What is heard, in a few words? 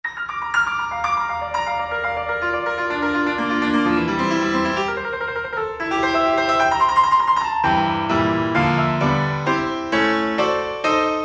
Grand Piano